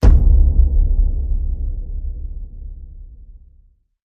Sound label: Hit Low Mellow Thud